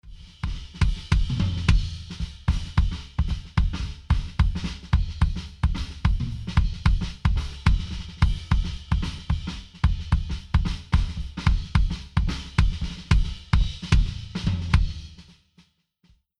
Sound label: Drums Kick Limit On